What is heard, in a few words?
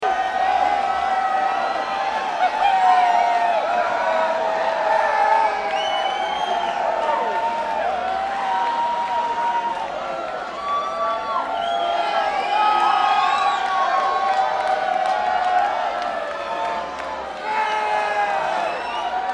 Crowd